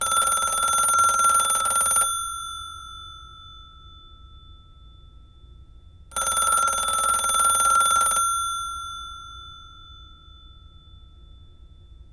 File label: Phone Ring